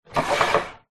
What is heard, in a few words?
wooden sliding toilet roll storage tower slide open or close; household